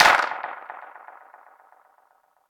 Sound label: clap single shot f sharp key; single hits one shots